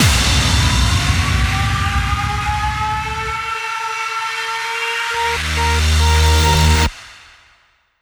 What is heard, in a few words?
140BPM FX 4 Bars; Vengeance Samples; Snares&FX Pairs